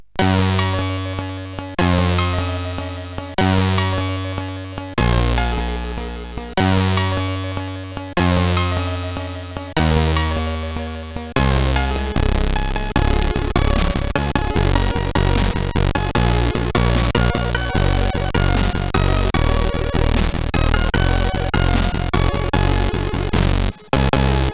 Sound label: CSG 8580R5